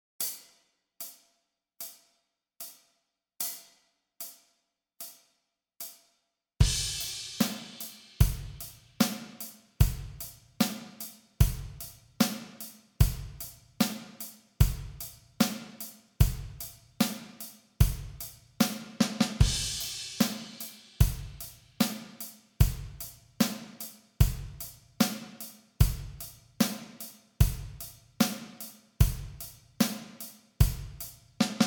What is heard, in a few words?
075 bpm, practice tracks